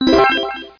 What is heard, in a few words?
PUP; riptide